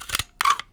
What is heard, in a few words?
Spring Loaded Plastic; bb - Smol Mechanisms